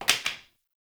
Plastic Broom Drop; Warframe; Ambience; Nineteen Ninety Nine

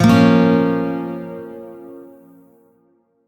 Shawzin Hirajoshi Chord; Warframe; Lotus Shawzin

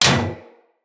Bullet Impact Generic Enemy Shield

